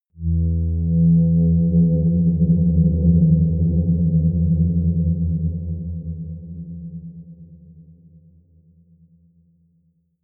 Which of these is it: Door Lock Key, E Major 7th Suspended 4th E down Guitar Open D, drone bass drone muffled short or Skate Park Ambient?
drone bass drone muffled short